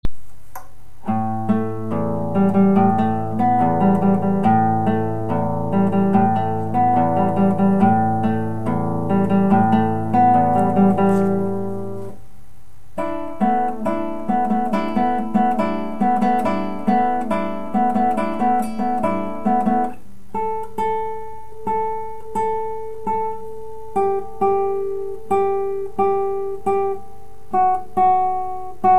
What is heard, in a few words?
kalimba